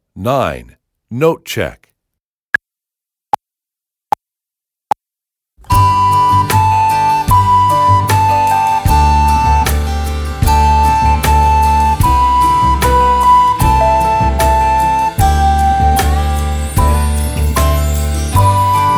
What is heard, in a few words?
Note Check